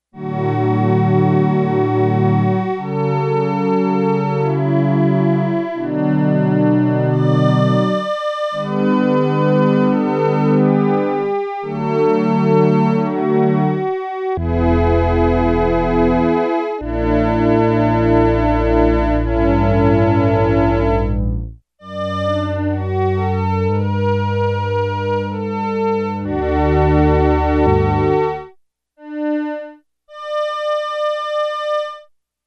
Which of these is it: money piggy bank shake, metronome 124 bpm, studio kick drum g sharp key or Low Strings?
Low Strings